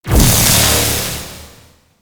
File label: Betrayal Beam; Pax Duviricus; Warframes